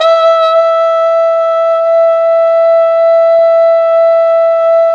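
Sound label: brass plunger trumpet, plunger mute; Plunger & Wa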